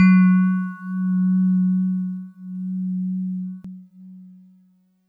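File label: DX100 F sharp 6; David Lynch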